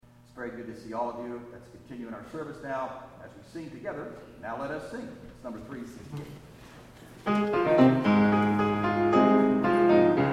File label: hymn